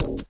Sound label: glass, footsteps, run